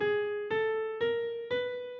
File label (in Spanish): escala